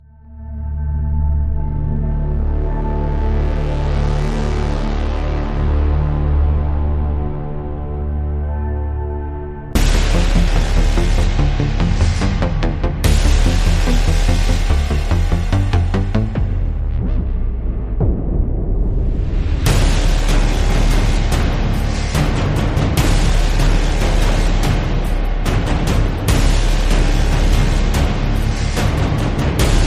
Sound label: Music; BattleBuddies; BattleSpider